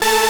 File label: synth; osu